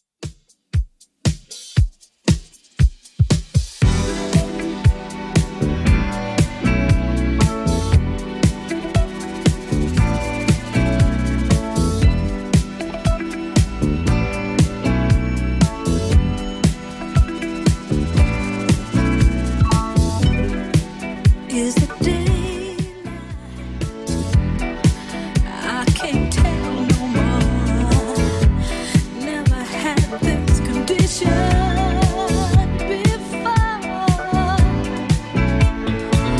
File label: 70s 117 bpm